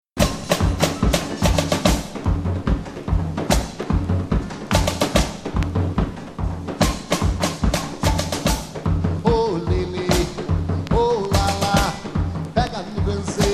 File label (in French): la samba